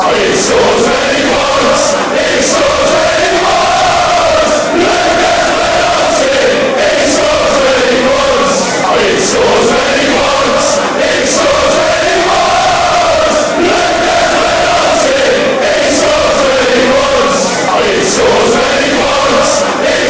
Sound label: he scores when he wants; lukas podolski